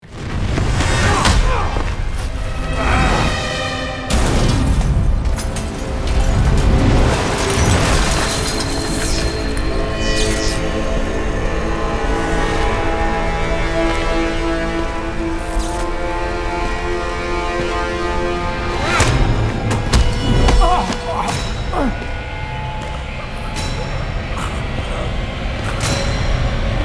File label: opening credits combined sfx music